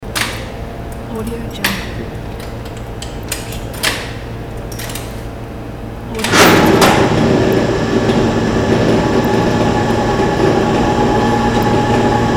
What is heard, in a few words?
prison door open close keys before hum; hollywoodedge